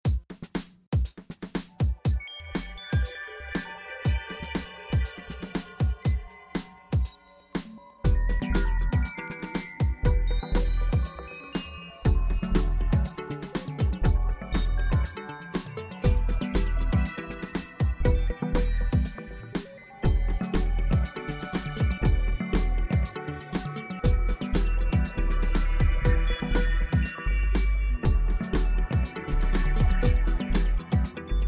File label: Sweet Beat